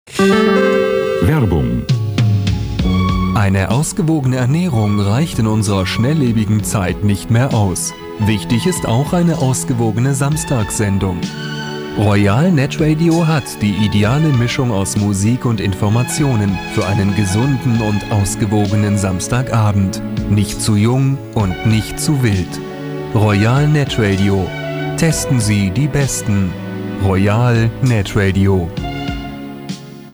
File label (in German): Jingle; Royal Netradio I